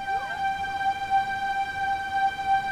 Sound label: PADS; Pro-Rec - Synthsonic; Z1 PADS